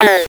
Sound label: talk